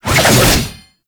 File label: Bayonet Melee Spin Whoosh; Warframe; Tenno